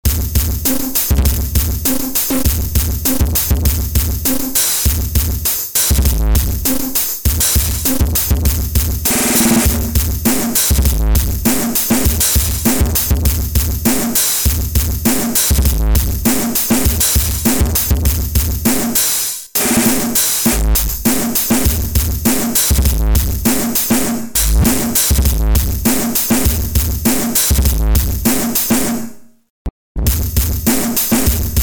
beat

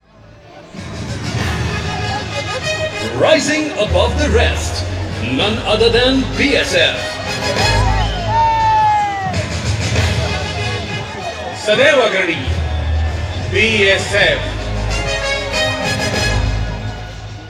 Wagah Border Ceremony India Pakistan Border; Samuel Corwin; Selected Field Recordings from India and Nepal Volume II Religious Music

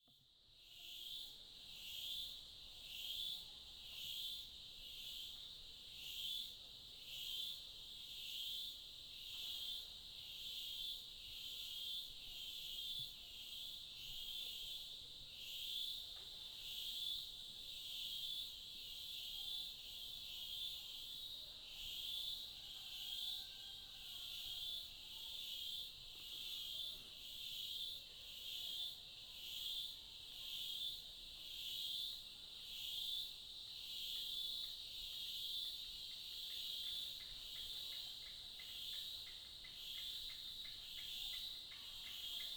Birds of Meishan; CHINA; Les Cartes Postales Sonores; NOISE ON EARTH Vol6

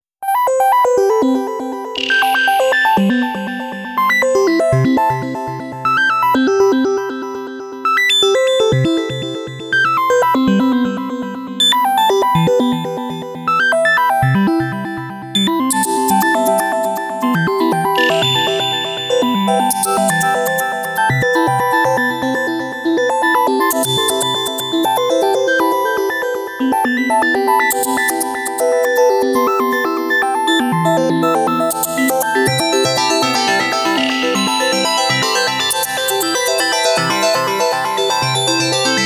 Quirky Pretty Beautiful Synth arpeggios; CUES for film TV games etc; POSITIVE

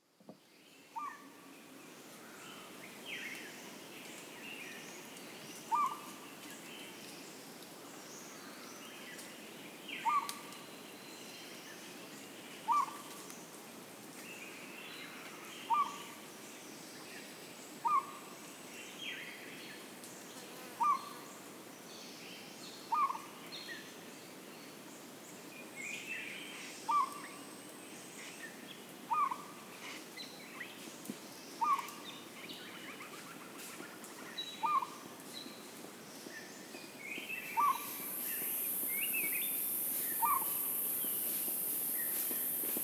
Flip Flops in the Jungle, Cambodia; Les Cartes Postales Sonores; NOISE ON EARTH Vol1

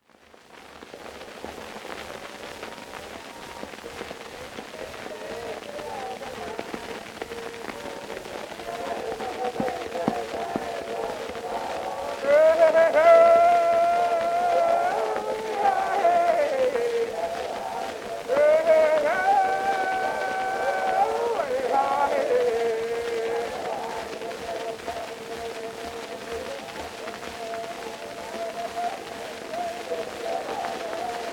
Tahitian field recordings, Chorus; Edison Blue Amberol; Himene Chorus; UCSB Cylinder Audio Archive

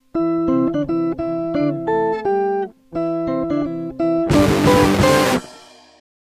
Jingle

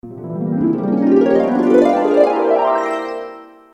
Miracle Jingle; Jingles for films podcasts

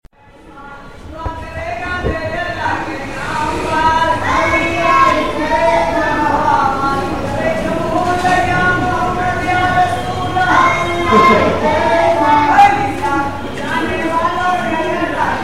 Begging Bhopas Birds, Field Recordings from India; WFMU

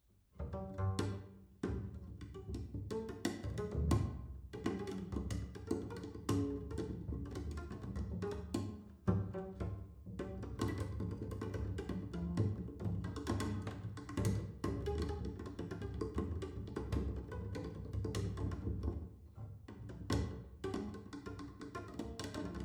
Prepared Double Bass

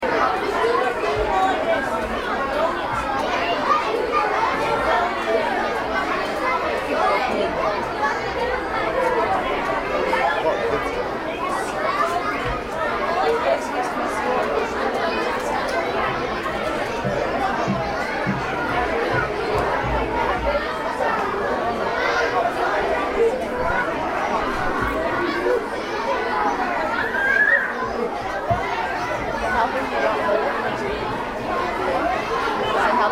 Ambience Brooklyn Zoo Kids Waiting for Train; Walla

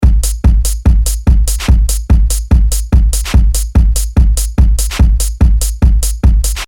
145 speed presse; Loop Mania Sampler Pack

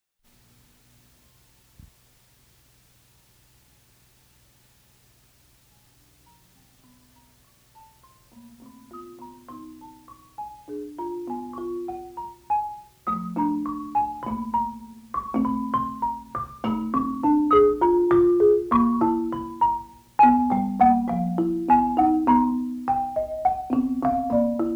marimba interlude

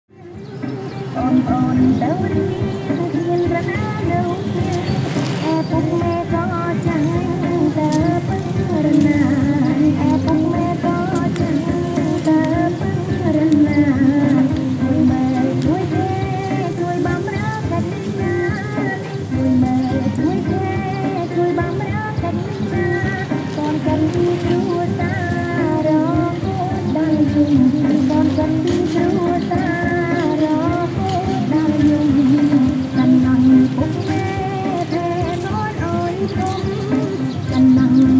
Fitness on the river side Phnom Penh; CAMBODIA

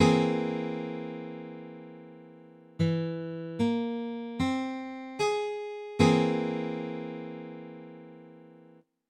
Cm7b5, Guitar Chords

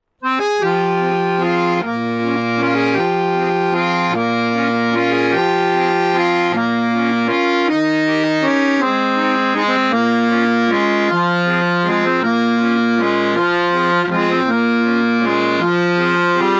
accordion

